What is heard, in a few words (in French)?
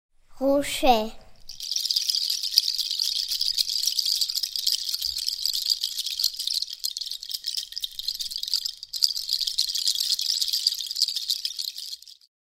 hochet